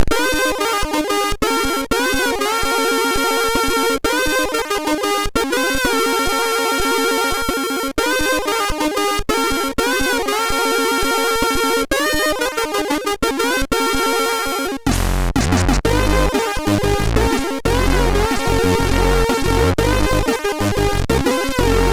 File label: Corny Jingle, MOS6581R3